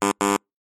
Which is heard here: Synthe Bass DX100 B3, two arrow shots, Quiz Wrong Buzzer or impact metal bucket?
Quiz Wrong Buzzer